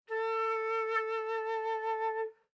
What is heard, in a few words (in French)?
Flute vib